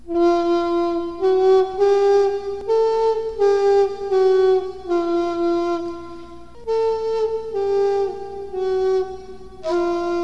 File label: flute prototype